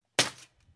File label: Plate Armor Hit